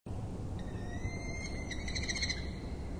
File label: Male Chitter